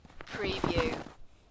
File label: Snow and Ice, Snow movement